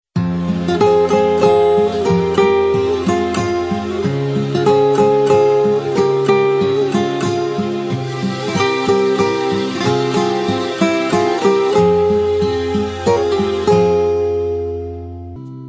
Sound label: Ringtone Mobile